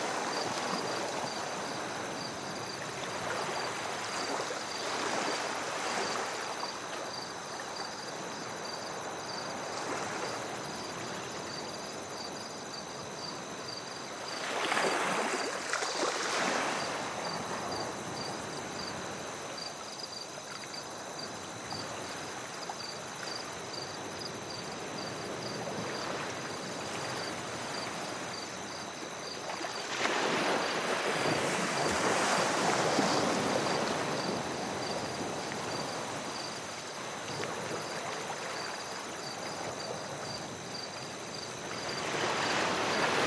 Ambience Golden beach ball

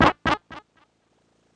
ghost hide

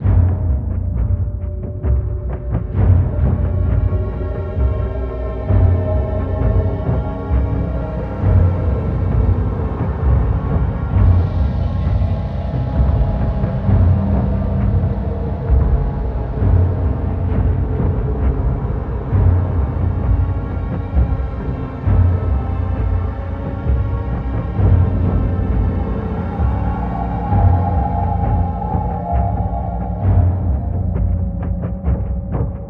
Grunt; Amnesia: The Dark Descent

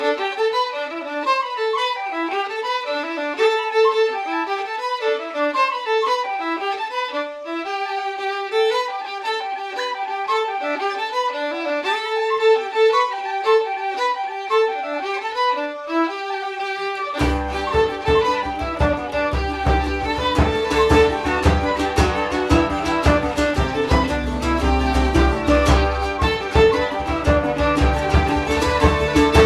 Orchestra Ambience; Assassin's Creed III

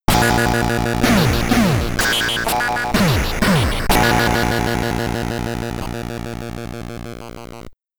Game Over (SID Stereo); Zoids; commodore 64

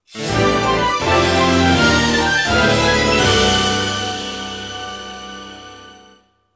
Jingle; Champion Jockey: G1 Jockey & Gallop Racer; Xbox 360

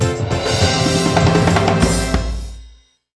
Goal Satisfied; madagascar; ps2

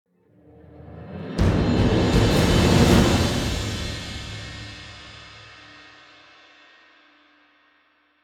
General Combat Transition; The Witcher 3 Wild Hunt; Ard Skellig

